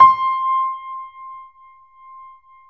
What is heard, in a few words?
C 5, Keyboards Acoustic, GRANDP ST; USB Soundscan vol.17